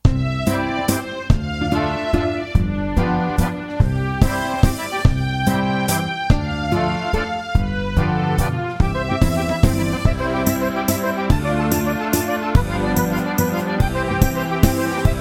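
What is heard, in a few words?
Waltz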